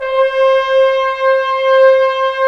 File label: BRS BNS, Roland LA Composer; Roland - LA Composer vol. 4; Tbns